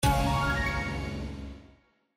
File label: SFX Symbol Jackpot Win; Kungfu Showdown